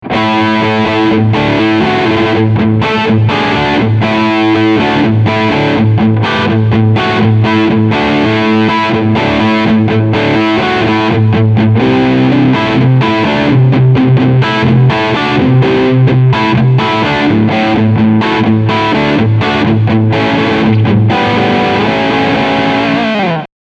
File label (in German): Overdrive Riff Steg PU